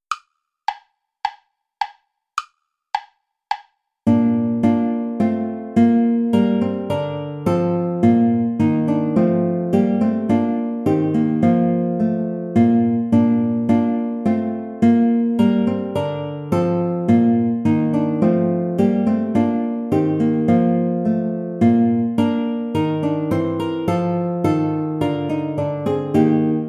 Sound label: play along without guitar